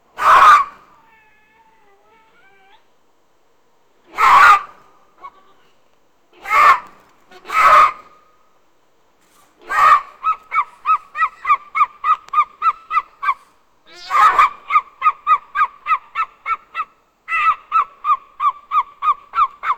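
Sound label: circus sea lion, circus; stefano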